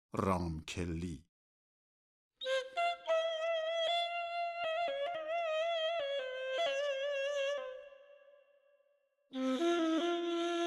Ramkelli Chahargah Ney; Dastgah Chahargah

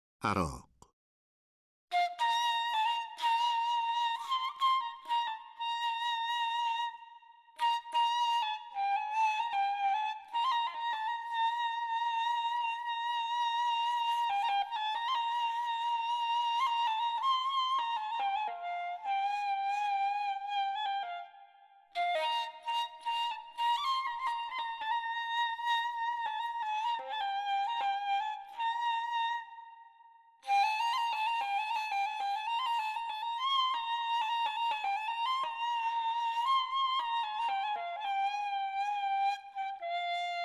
Aragh Afshari Radif-e Ney; Avaz-e Afshari

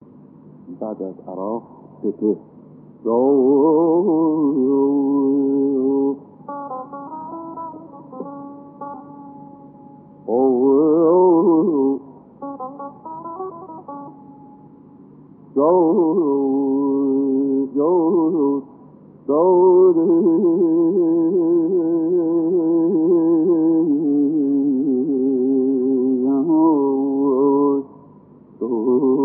Sepehr Nava, Dastgâh Nava

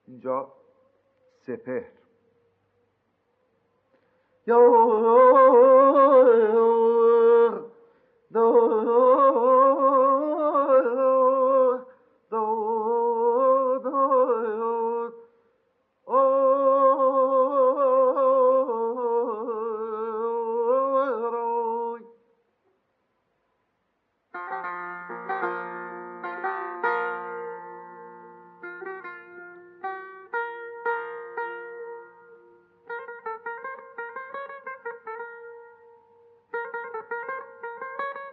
Sepehr Mahur, Dastgâh Mahur

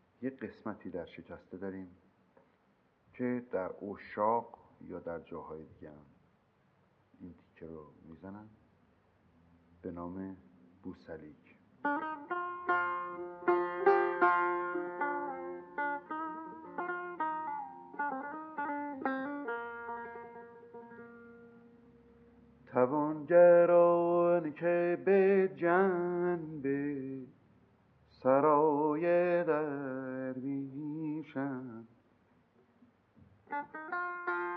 Busalik Mahur, Dastgâh Mahur

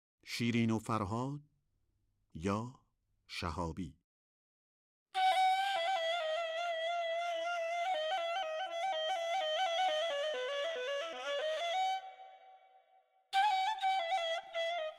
Shahabi Bayat-e Tork Radif-e Ney; Avaz-e Bayat-e Tork

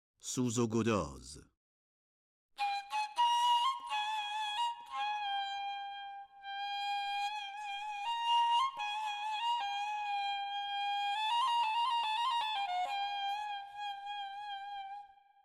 Suzo godaz Isfahan Radif-e Ney; Avaz-e Isfahan

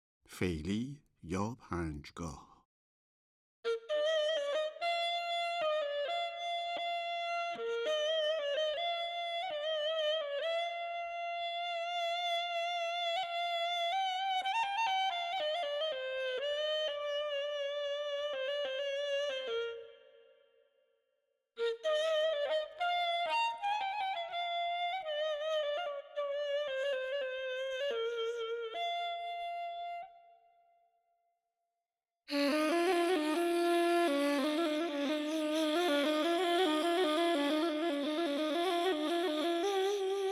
Feyli panjgah Mahur Radif-e Ney; Dastgah Mahur

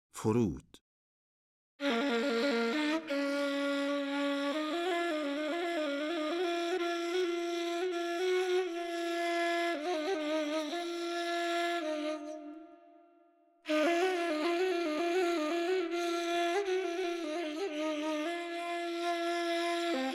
Forud Afshari Radif-e Ney; Avaz-e Afshari

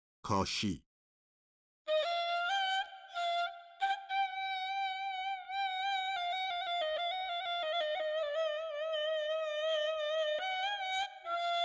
Kashi Dashti Radif-e Ney; Avaz-e Dashti

